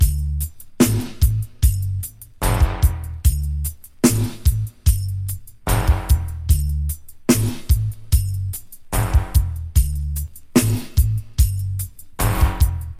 74 bpm drum loop sample d key, Free breakbeat sample - kick tuned to the D note